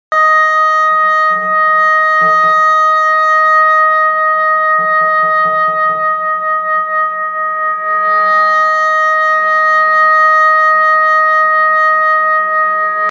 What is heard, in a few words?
Feedback Intro